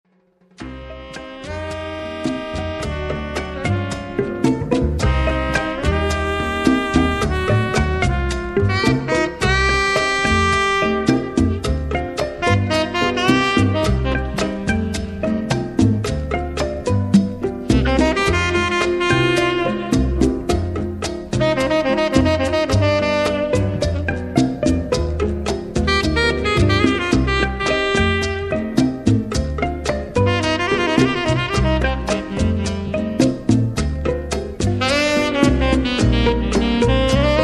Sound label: Latin Jazz; Putumayo World Music